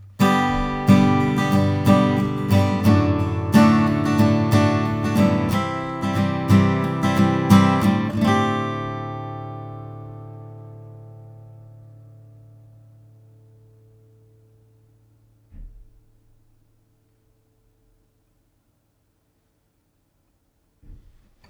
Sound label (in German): a gitarre neumann tbone rb500